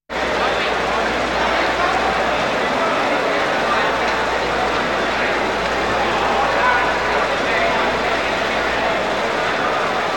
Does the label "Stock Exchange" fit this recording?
Yes